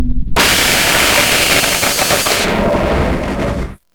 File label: jumpscare music only